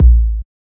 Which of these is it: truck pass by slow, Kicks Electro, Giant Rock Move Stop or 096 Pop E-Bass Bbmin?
Kicks Electro